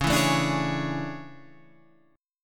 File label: A Major 11th, guitar